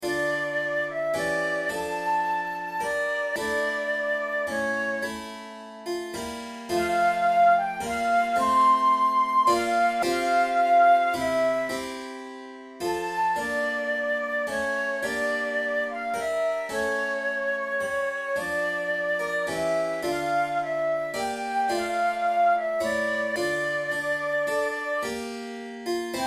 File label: recorder sonata in f major siciliana, flute